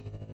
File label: buzz